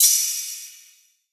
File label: Ride; Cymbals; Ghosthack; Color Bass Essentials